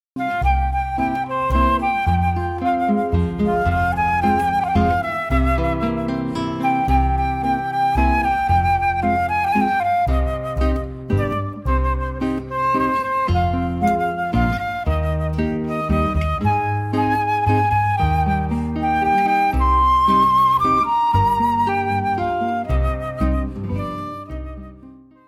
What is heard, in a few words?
guitar flute bass trio